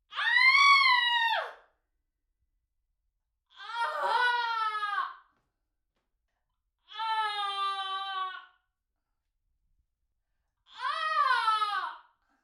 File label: Four Screams